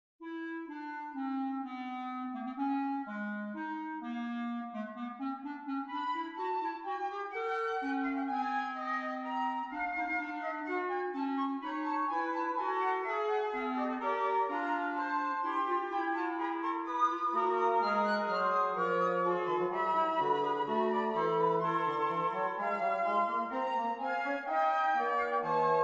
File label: fugue in 74 edo